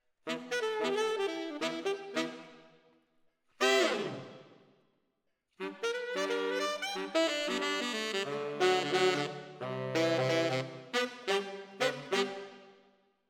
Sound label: ORTF SCHOEPS, piano